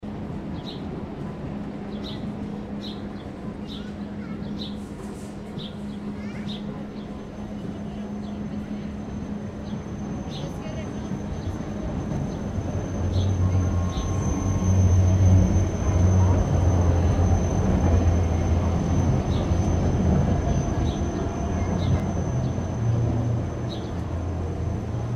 station; berlin alexanderplatz

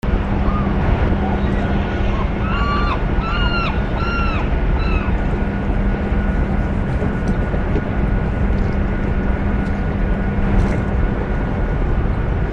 harbor at the docks

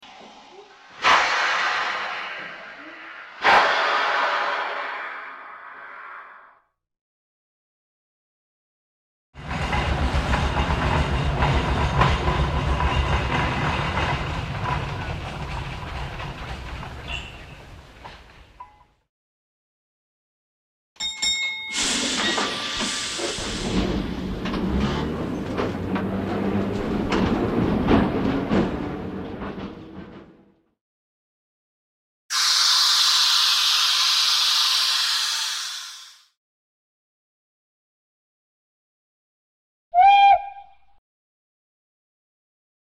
steampunk set

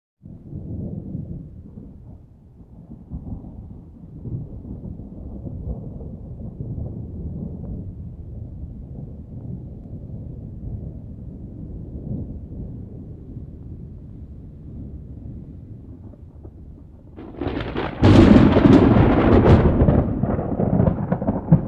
thunder no rain

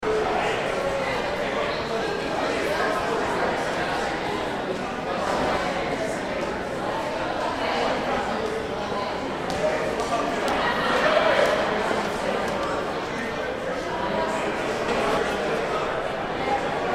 hotel lounge crowd; ca san jose fairmont hotel

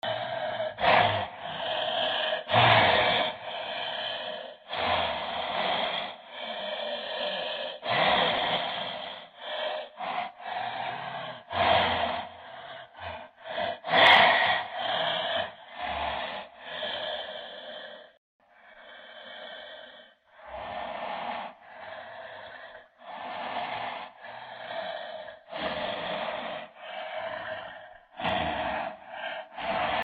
dark heavy breathing

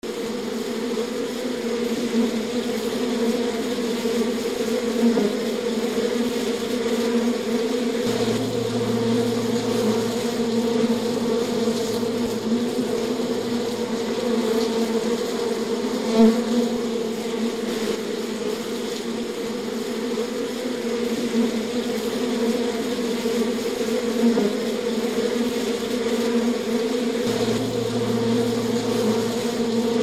insects too many bees

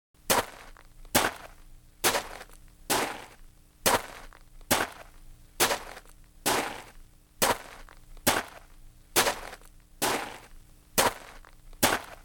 footsteps unisex gravel

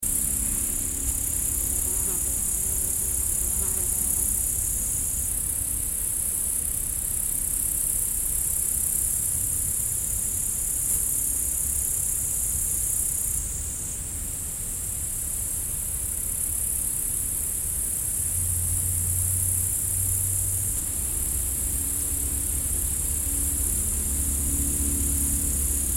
crickets; germany deutschland

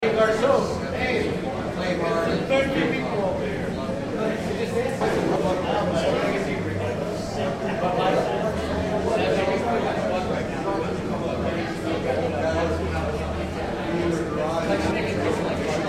beach restaurant crowd; pismo

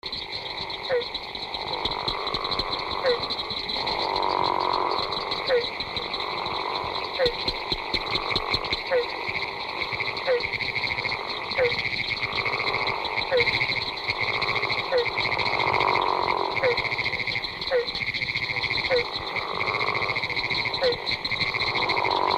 frogs gopher and leopard frog chorus

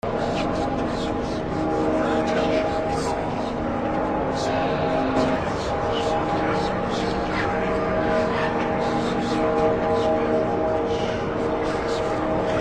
dark temple bells and slowed whispers

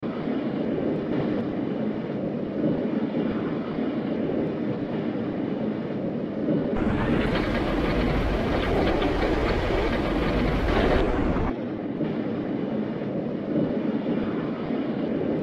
dark twisted machinery

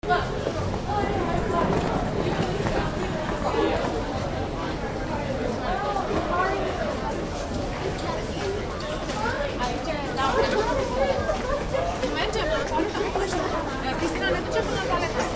airport arrivals; germany berlin tegel airport

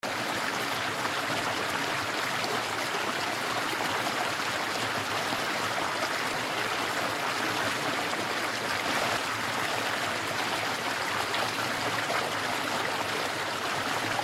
water brook fast activity

